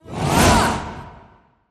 Vocal Fury Attack Flyby

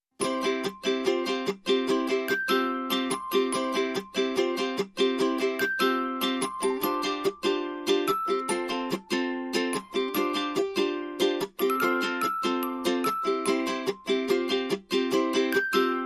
Ukulele and Glockenspiel

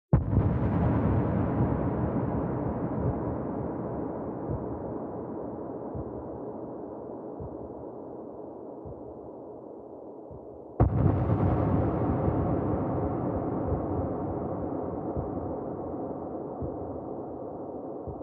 Booms Only

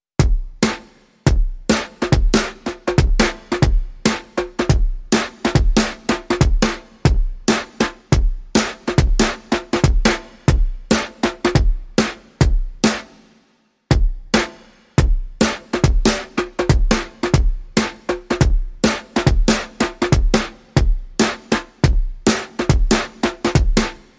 Back Beat and Toms Only

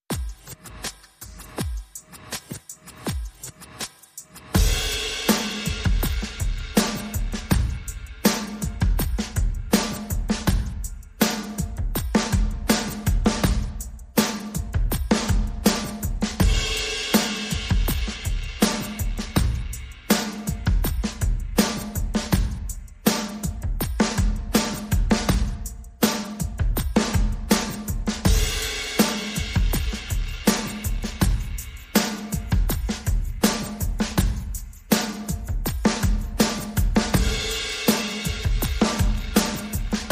Drums Only

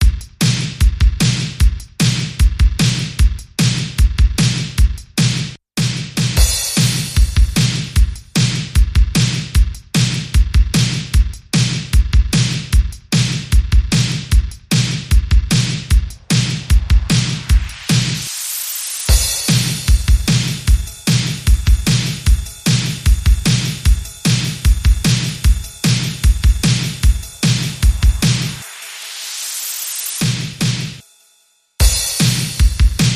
Beat

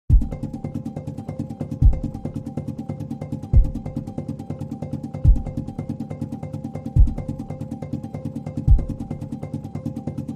Fascinating Facts, Drums Pulses and SFX Only

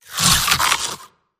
Crunch

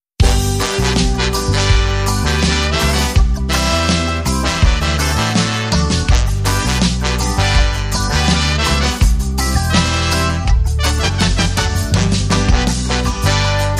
Sea Sun Reggae

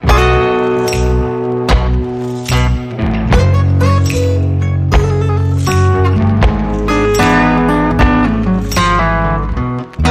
Blues

